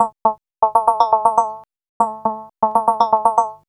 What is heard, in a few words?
POLYMOD; AMG - Vince Clarke Lucky Bastard